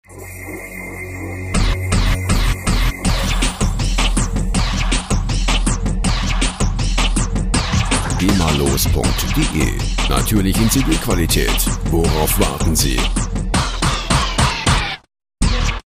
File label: mambo